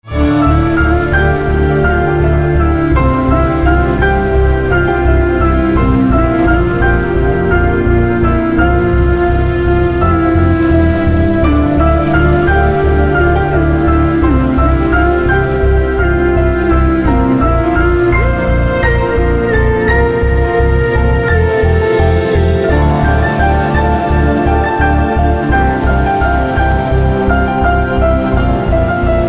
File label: Magical Tune; awarapan 2007